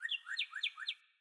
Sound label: Cardinal Male Bird Song